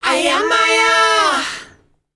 KIDS, S. AFRICAN, World Voices; ILIO; Vocal Planet

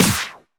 Snares; Vengeance - Essential Clubsounds Vol.4

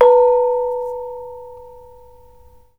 KONGWONGLEK G#4; Spectrasonics; Heart of Asia

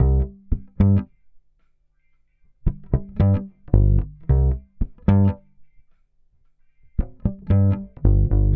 112 Cm DISCOELECTRO BASS; 112 BPM

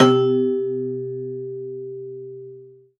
Rubbery Piano, prepared piano; Total Piano

